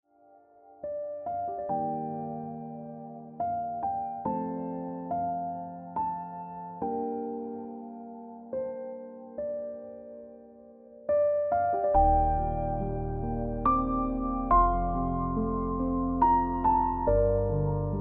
Ringtone Piano Music

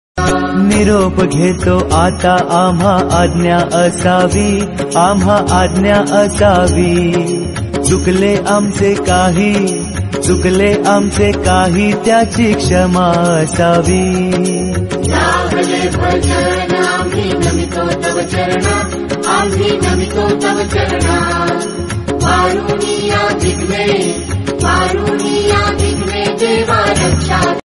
Marathi Ganpati Song Ringtone

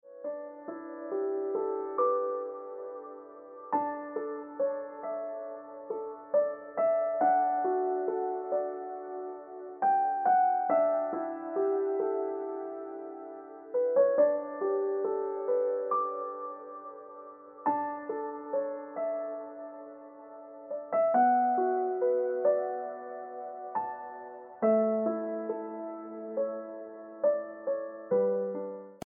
Ringtone Piano Music

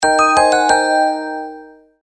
Jangler Ringtone

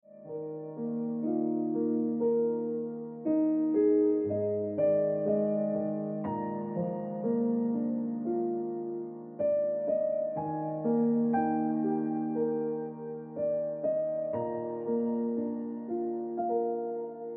Like A Radio Song Ringtone Piano Music

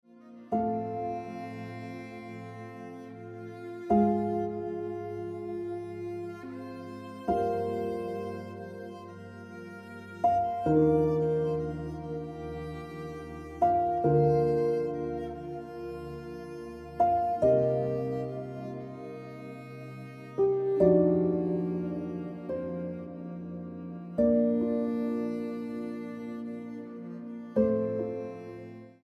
Jade Ringtone Piano Music

